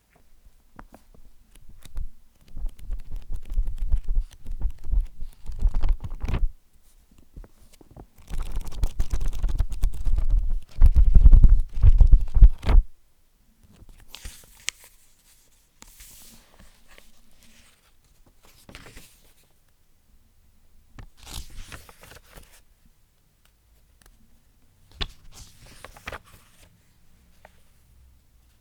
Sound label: old book noises